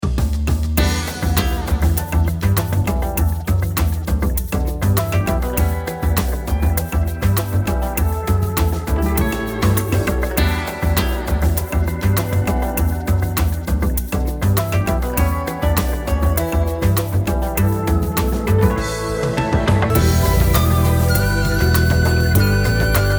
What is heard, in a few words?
smooth groove